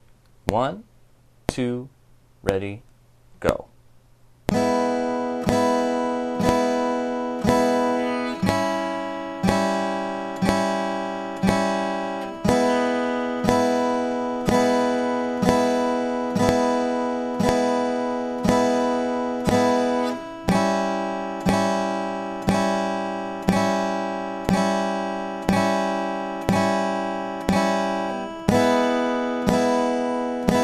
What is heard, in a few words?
baby chords play along, guitar